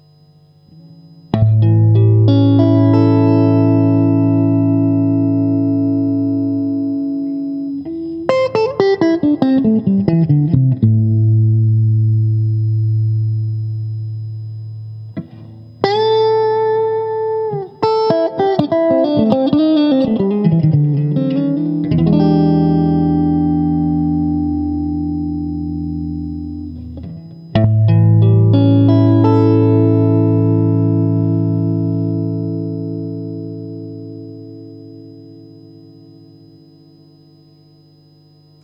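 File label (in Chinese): 60S OCC 单晶铜 清音 2档, acoustic guitar; kindman 60s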